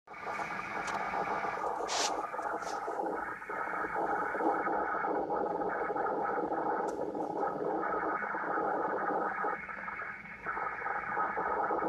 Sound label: noise